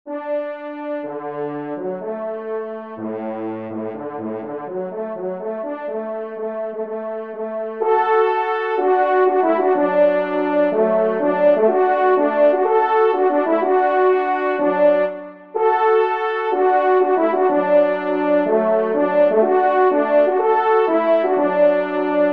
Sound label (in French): Marche des Veneurs, Do Maj, trompe; P. Lauge